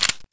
357 hammer pull; weapons

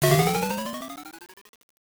8bit

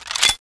rpg draw; weapons

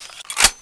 usp clip in; weapons

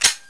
9mm cock; weapons

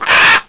squawk loud

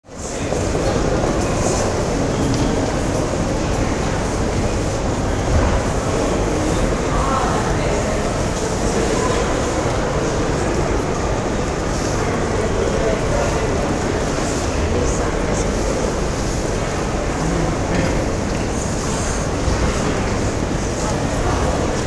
station hall, train

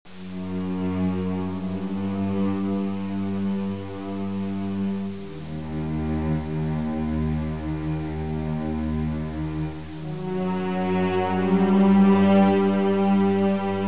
scary music

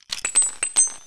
357 bullets; weapons

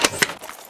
law draw; weapons

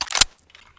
mp5 mag in; weapons

